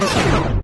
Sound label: hovercraft shut down; There; ThereClient